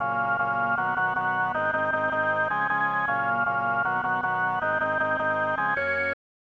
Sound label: Stadium Organ; super bases loaded 2; snes